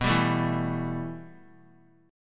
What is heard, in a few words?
B 7th Suspended 4th Sharp 5th; B7sus4#5; strummed; JGuitar